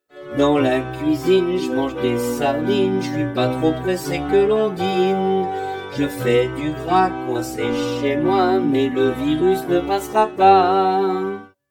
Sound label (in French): couplet ralenti; Couplet 2 – Chanté